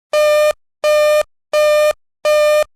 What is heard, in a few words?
beep muffled; narrations; Weatherscan